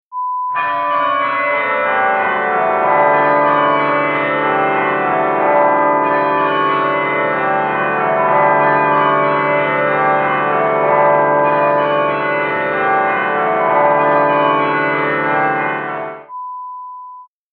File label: Wedding Bells sound